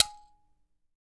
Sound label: G#4 M BIRA; AKAI S6000; WorldSounds